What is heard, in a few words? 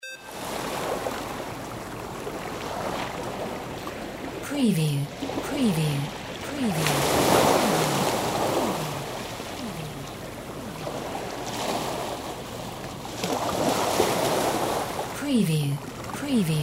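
WATER SEASHORE WAVES; windy beach audio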